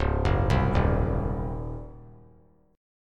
C sharp Major 7th Suspended 2nd Suspended 4th, C sharp, chord; arpeggiated